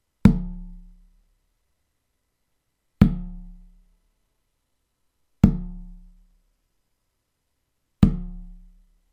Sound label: helmholtz 34.7Hz